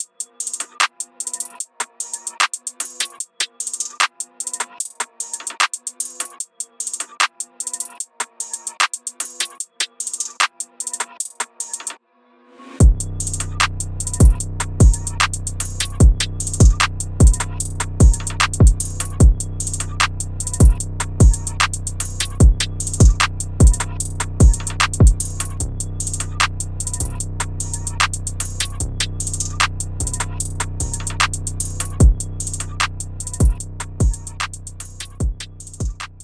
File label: DRUMVX; SHOBEATS